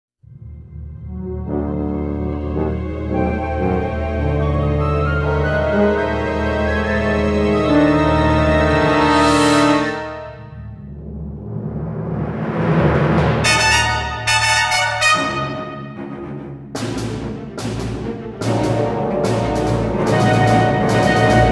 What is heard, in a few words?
Cheesy Escape Music; NIS